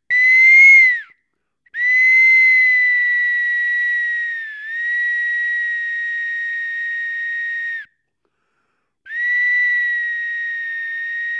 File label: solo